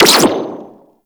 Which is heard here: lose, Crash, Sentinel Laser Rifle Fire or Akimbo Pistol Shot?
Sentinel Laser Rifle Fire